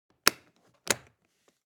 Opening a metal briefcase

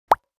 Cartoon funny pop

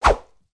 Very fast swish

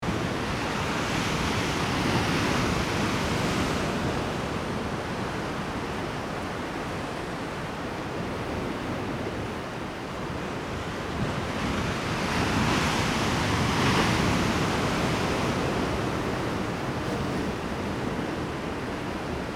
Powerful ocean waves crashing loop

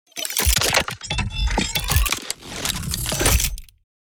Cybernetic transformers robot transformation

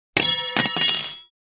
Dropping wrenches on the floor; gfxsounds

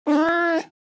Soft cute cat meow meowing; animal

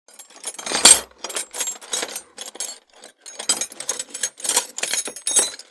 Toolbox searching rattling and movement

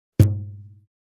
Cartoon elastic pluck wobble; boing

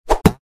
Scythian bow and arrow shot

